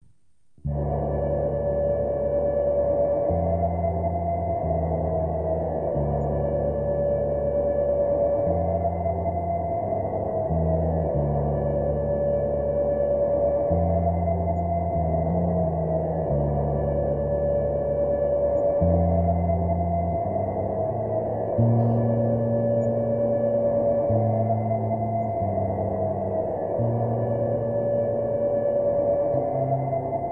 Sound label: wind